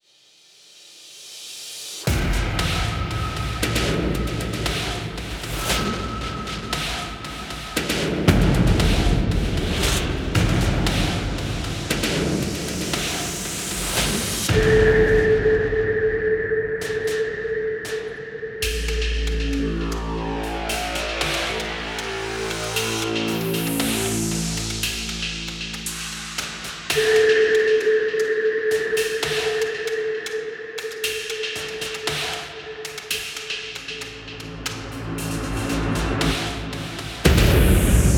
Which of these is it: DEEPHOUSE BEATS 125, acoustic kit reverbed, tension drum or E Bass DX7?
tension drum